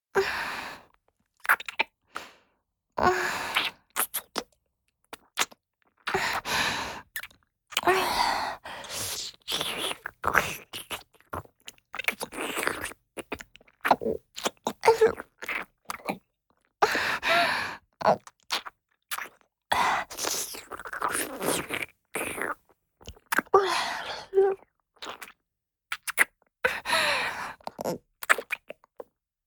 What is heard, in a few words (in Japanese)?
キス(中)：舌先を絡めるキス